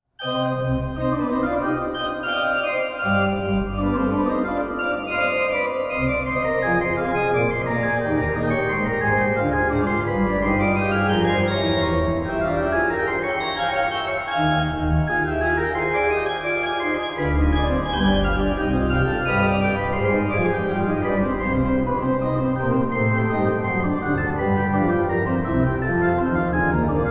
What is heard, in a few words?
Triosonate II Vivace